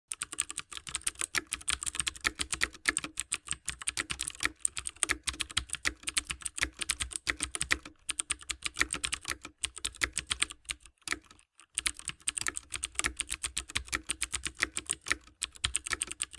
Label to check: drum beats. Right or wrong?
Wrong